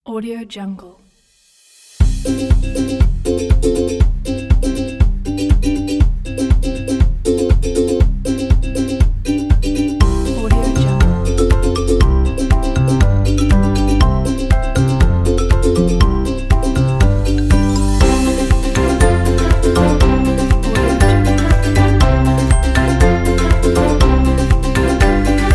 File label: upbeat ukulele